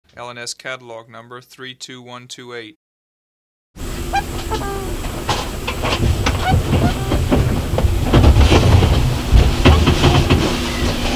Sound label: coscoroba swan